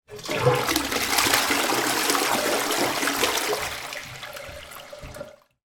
household toilet flush cistern; sound effects one

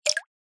nature water drip single; zapsplat

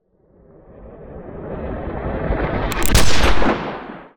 Gun Shot

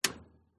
Switch Electrical Panel Breaker Off; uberduo

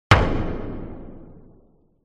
CINEMATIC HIT Filtered Noise Impact; smartsound

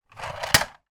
toy truck car crash into wall; sound effects one

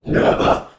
Demon; little robot sound factory

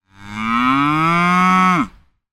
animal bull scottish highland moo

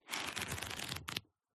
Book, Paper, Pages, Flip Through; PMSFX

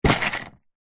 industrial tool box plastic set down on ground

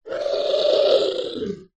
science fiction alien growl

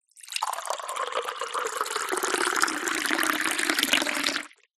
pour water into mug from kettle; food drink

